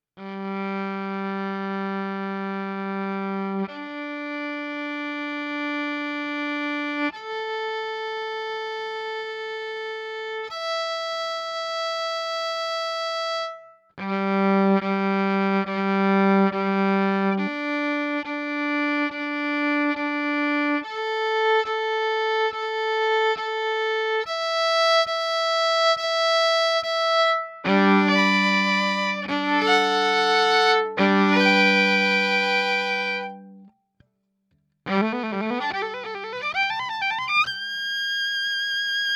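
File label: Vln Yamaha pickup flat, violin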